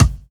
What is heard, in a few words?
BD 70 S; E-Lab; X Static Goldmine 2; akai